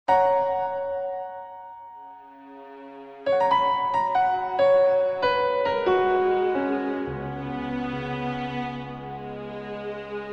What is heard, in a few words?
romantic; orchestral / movie style